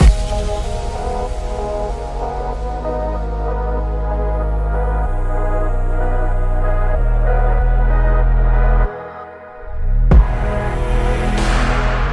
Ghost Instrumental; Need for Speed No Limits; NFS Edit Instrumental 02

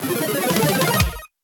Opening Jingle

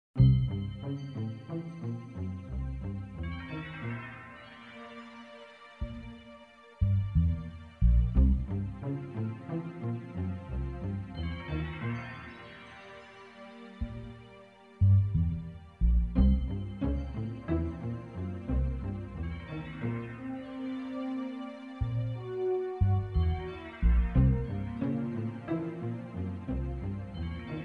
menu music; Sid Meier's Alpha Centauri

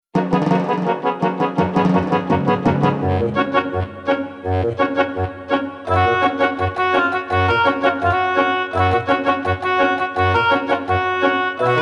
BGM Event Joyful; Neo Atlas 1469; PS Vita switch windows

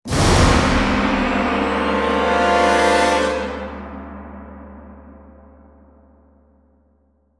Salvation Stinger; Salvation Prophecy

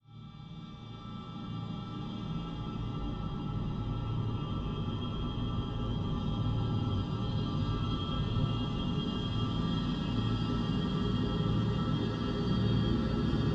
Dark Ambient

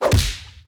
card take damage; Animation Throwdown; cardbabies

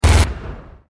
Gatling Shot; android; gamerip; Blockman Go

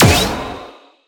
Vehicle Positive; A Dance of Fire and Ice

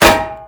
Physics Metal Solid Impact Bullet; ps3 windows xbox 360; Counter-Strike: Global Offensive

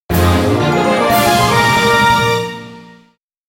Get Heart Container; the legend of zelda the wind waker hd

